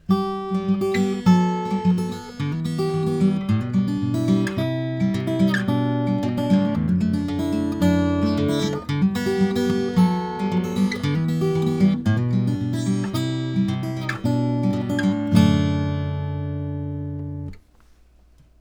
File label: guitar